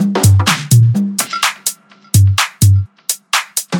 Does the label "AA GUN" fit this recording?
No